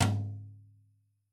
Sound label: Toms, Yamaha RY20